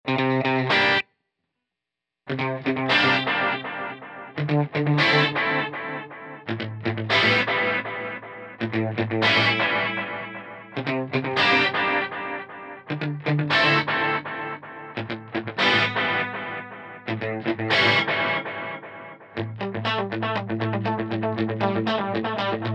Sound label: TimeLine MX Wall Flange, strymon timeline mx